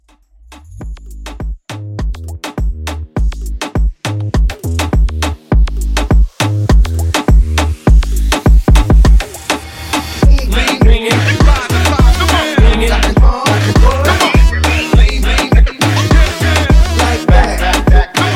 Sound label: Moomba Hype 102 DIRTY